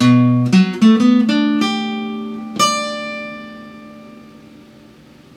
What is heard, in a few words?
guitar